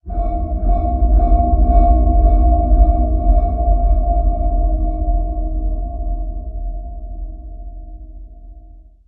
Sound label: Ambient; Barotrauma; Room FX